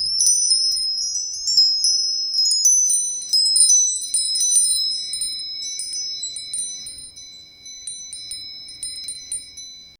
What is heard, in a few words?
slow softly twinkling chi, christmas